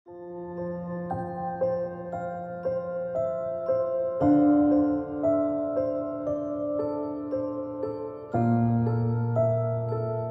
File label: Piano Relaxation, Spa